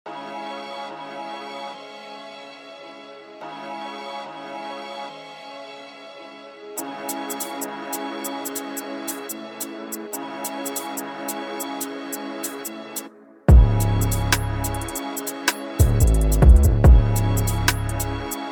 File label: UK DRILL; MP3 PACK